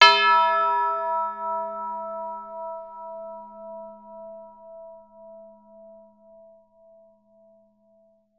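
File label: tubular bells E4; Advanced Orchestra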